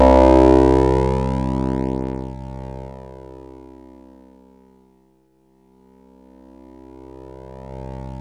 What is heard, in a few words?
SINN LEAD, MORPHEUS; Syntec; Wall of Sounds